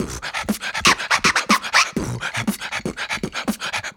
120 Puppy Ratchet, World Dance; Spectrasonics - Supreme Beats

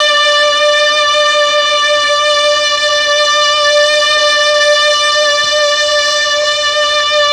MEL STR, Legendary M400; ILIO; Propeller Island

